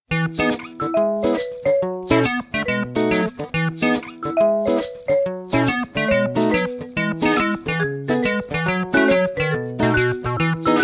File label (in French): Orchestre; cirque